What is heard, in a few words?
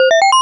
success